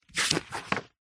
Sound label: household book thick heavy encyclopedia modern glossy page turn; zapsplat